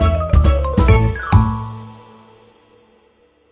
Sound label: wrong